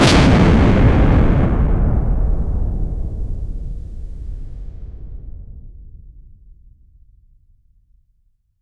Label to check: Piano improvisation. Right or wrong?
Wrong